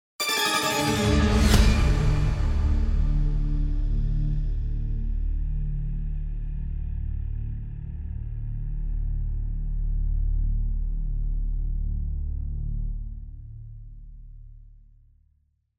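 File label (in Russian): переход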